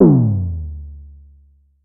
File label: Drum X Tom, Pearl